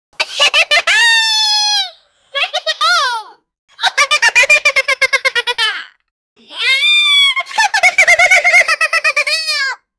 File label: cute high pitched kid lau